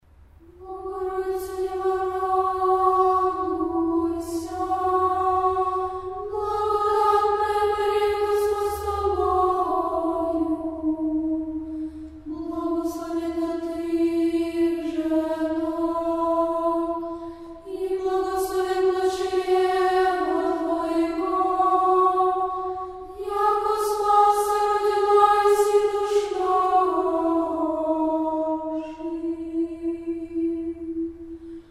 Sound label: child choir